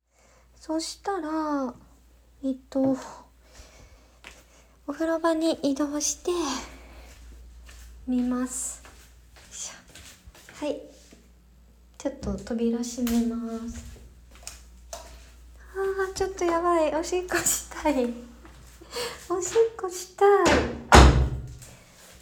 実演オナニー

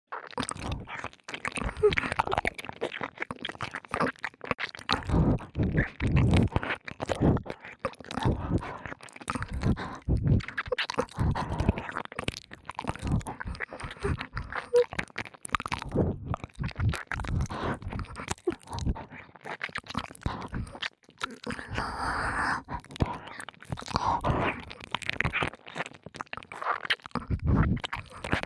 (左) 激しい耳舐めエッチ, ASMR; 黒耳; 効果音無し版

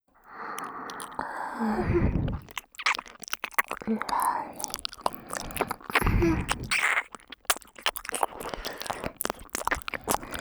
溺愛メイドのＷ耳舐め添い寝

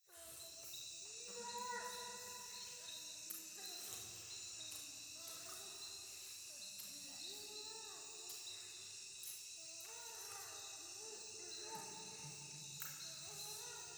イラマチオ 山奥夜

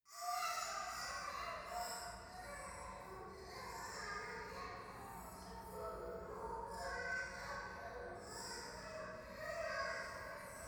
夢の中 シャブ喘ぎ 電車

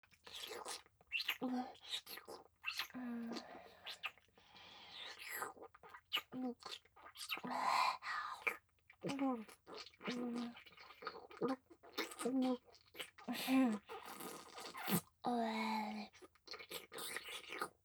フェラ, ASMR; loop音声

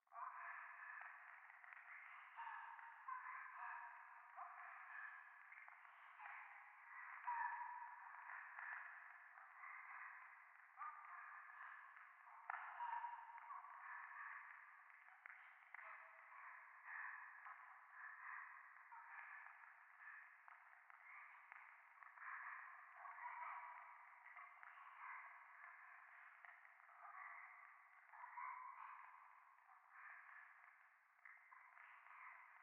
廃墟 右耳密着処女喘ぎ 暖炉

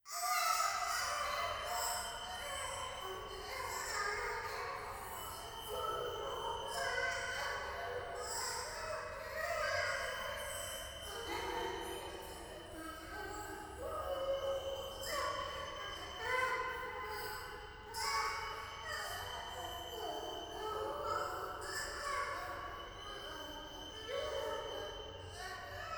教会 シャブ喘ぎ 山奥

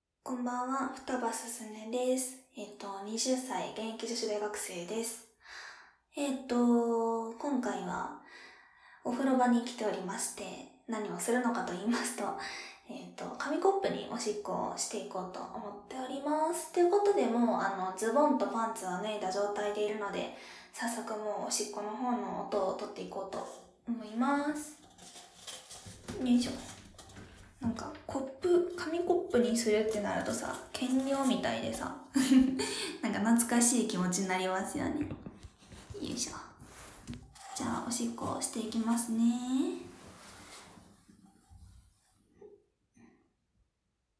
紙コップに お風呂で オシッコですッ

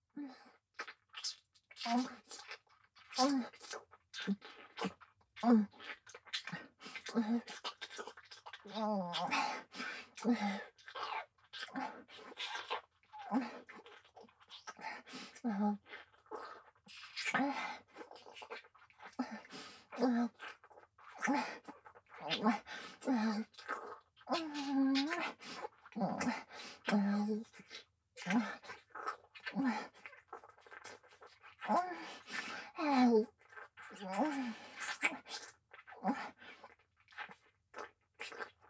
アナル舐め

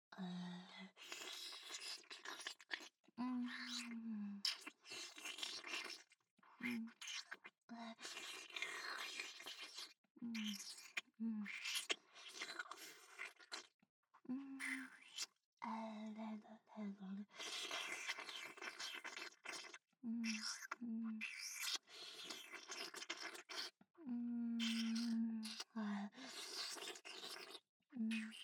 膝舐め, ASMR; アドリブ集

